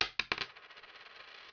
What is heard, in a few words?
coin dropping